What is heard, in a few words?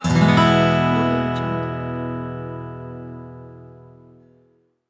major guitar chord